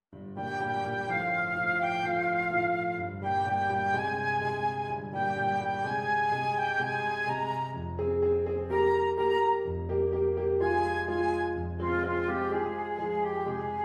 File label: flute Vignette